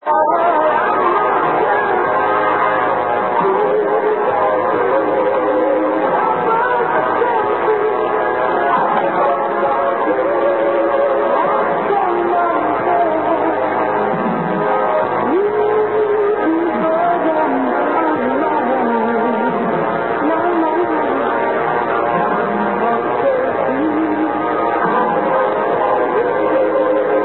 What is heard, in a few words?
Grenada 535 kHz